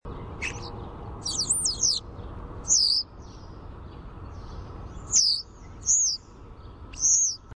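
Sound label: European Starling whistled song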